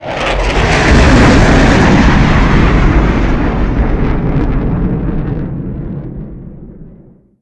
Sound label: mig29 flyby short